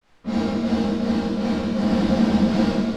Cinematic rooms REVERBERATION X FEED Level 0.0 Delay 100ms Rolloff 500Hz